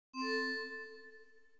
scrollable enter, Old TalkBack Sounds

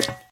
metal step; Original TK Sounds

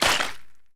mud step; Golden Crayon Sounds

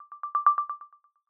recurring Disclosure Twinkle; iOS Sounds

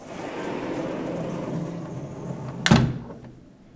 door close; Golden Crayon Sounds

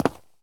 concrete land; Golden Crayon Sounds

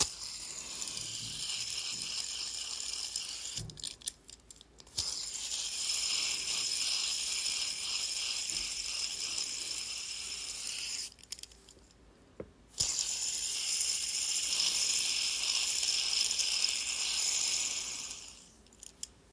fidget spinner; Golden Crayon Sounds

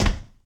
ceramic land; Golden Crayon Sounds

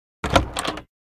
door open; Golden Crayon Sounds

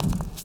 roof step; Golden Crayon Sounds

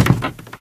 old wood step; Golden Crayon Sounds

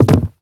wobbly wood fall; Golden Crayon Sounds

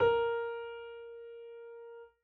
piano a#; Golden Crayon Sounds

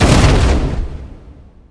pipe fall; Golden Crayon Sounds

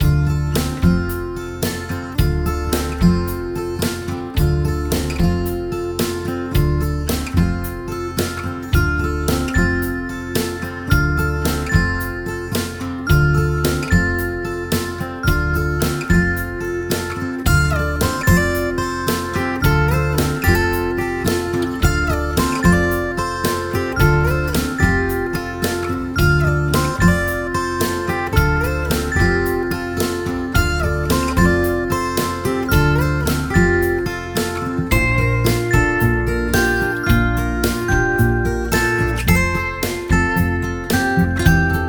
entertainment string

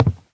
wood step; Golden Crayon Sounds

